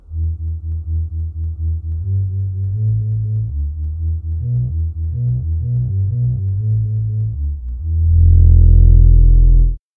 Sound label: recorder; bass